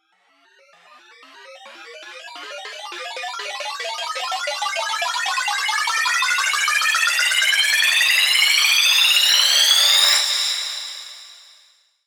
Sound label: Riser